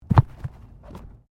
Body movement Tumble Medium; Body movement foley to simulate falls and deaths; Rolling

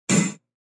Clank, Metallic; Clank Hit

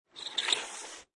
Bicycle Skid, Bicycle skid, bike tyre screech; Bicycles (Sound Effects:Vehicles)

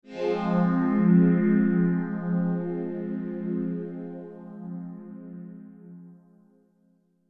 Swell Ambient, Swell Ambient Phase; Swell Sound Effects

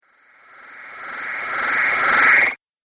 Cartoon Magic Spell

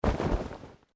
Clothing Shirt Cotton Move

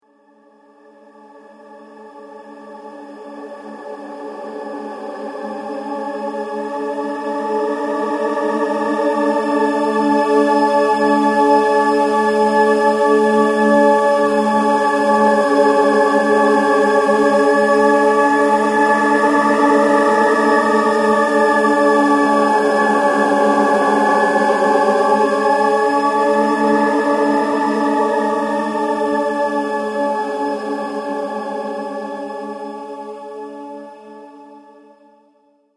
Twisted Angels Drone; Drone Sound Effects

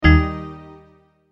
Multimedia Tone-Stop; Sound Design; McKinney Sound

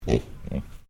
Animal Pig Tamworth Grunt; Hamish; Edgar's Mission Farm Victoria; Pigs; Animals

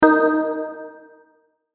Hit Bells Reverb; Sound Design

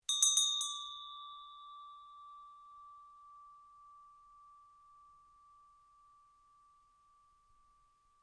Bell Tibetan Ring, Tibetan Brass bells; Musical

